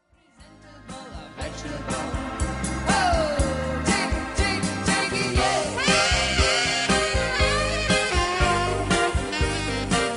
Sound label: sax solos